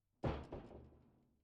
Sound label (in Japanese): 耳マッサージ（右耳）, ASMR